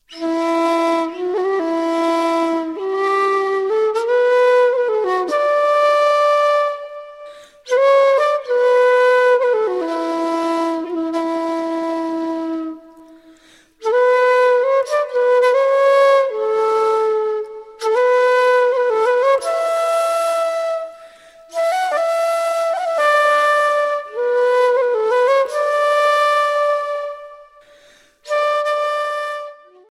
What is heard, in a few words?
Slow air ukazka